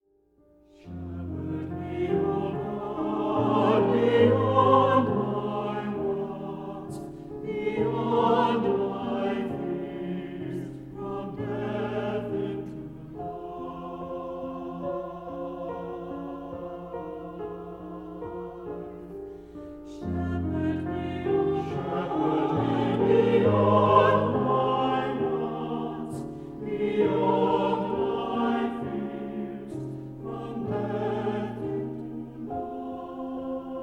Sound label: Shepard me O Lord